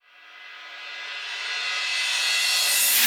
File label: Reverse Cymbals; Vengeance - Essential Clubsounds Vol.5